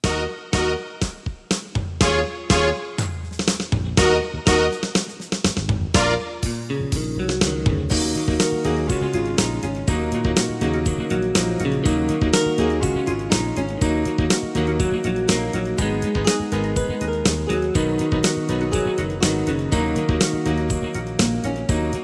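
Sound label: instrumental with brass lead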